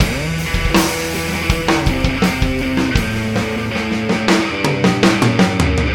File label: loop guitar